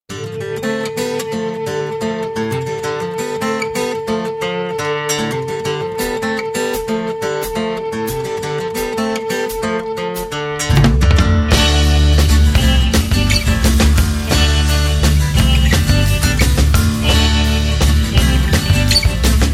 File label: screamer